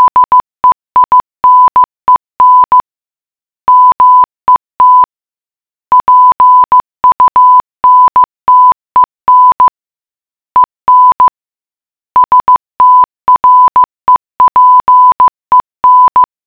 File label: morse; nederlandsscheepvaartmuseum